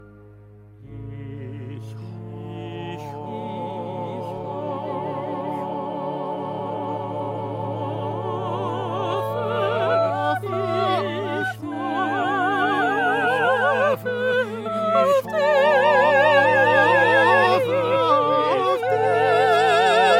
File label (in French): Tutti sauf tenors; mesures 163 à 173; Requiem